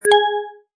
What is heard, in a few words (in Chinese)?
撤销